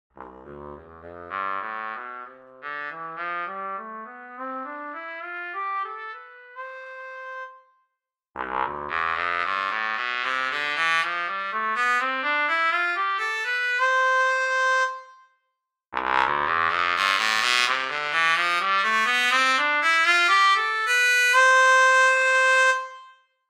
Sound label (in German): Tenorposaune Straight